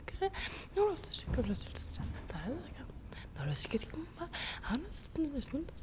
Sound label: whimper; Mafie 1